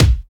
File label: acoustic kick sample a sharp key, Royality free kickdrum tuned to the A# note; kick drum samples